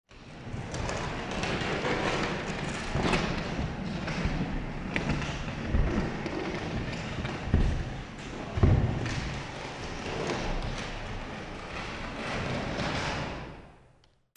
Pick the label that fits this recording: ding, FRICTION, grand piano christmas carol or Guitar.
FRICTION